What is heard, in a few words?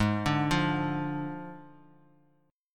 D Diminished, chord